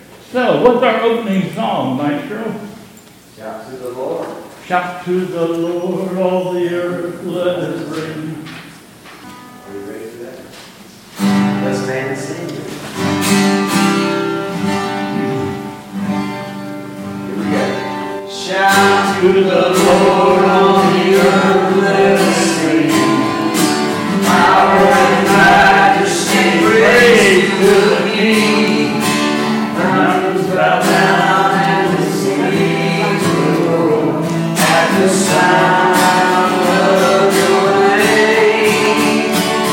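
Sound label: Hymn, church service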